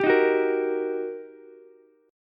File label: G Minor Major 7th Sharp 5th, down, Ukulele